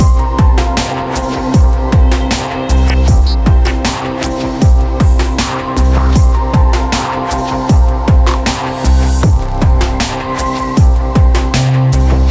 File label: Space Menu Loop